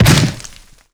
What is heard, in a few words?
punch wood